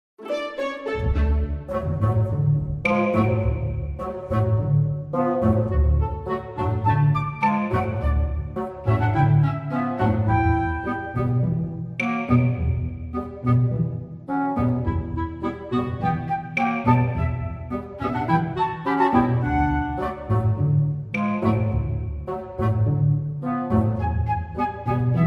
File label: Orchestral Cover; The Legend of Zelda - The Wind Waker